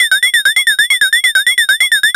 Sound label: VCS cowbells; Zero-G Ambient 1